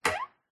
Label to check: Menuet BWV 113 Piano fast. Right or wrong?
Wrong